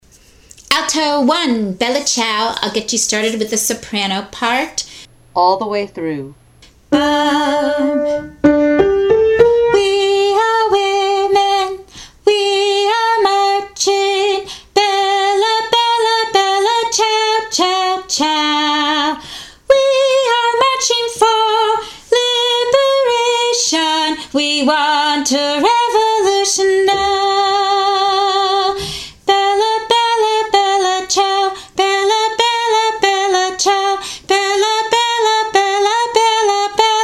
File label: Alto I; all the way through; Bella Ciao